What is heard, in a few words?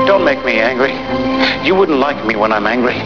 angry